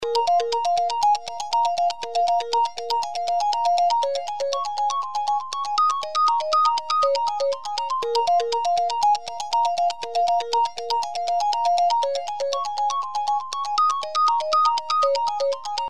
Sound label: sparkling water